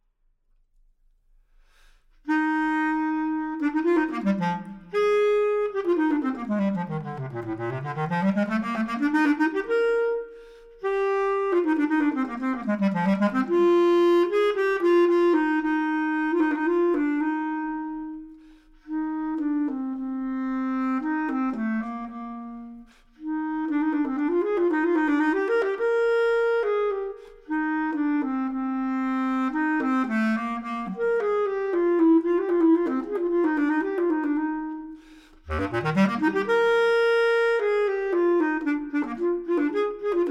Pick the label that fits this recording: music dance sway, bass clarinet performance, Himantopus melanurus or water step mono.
bass clarinet performance